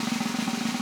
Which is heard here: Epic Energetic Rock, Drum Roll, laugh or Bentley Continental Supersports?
Drum Roll